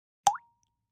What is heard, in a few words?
Splash Sml Plop Drink